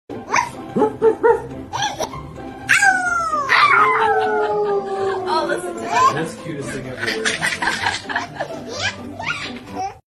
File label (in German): 7 Hunde